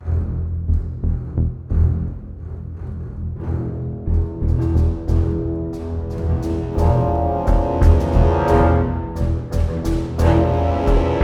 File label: Music EpicSpawn Stereo; MushroomBoss